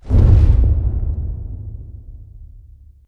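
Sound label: Explosion Rumble Exterior Distant Punch; Boomtown; Titanfall 2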